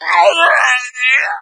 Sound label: death, hazmat zombie; doom3